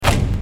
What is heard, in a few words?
Hollow Ball